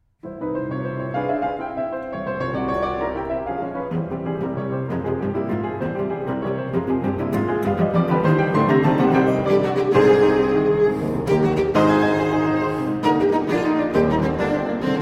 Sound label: Cello Sonata, Allegro passionato; No. 2 in F Major, Op. 99 III.